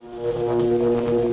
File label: charged bolt; spells